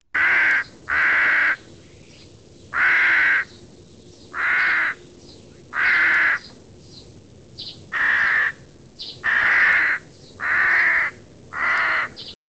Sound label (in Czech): zvuk, ptaci